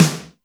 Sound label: Snr Fat City, Alesis D4 drum machine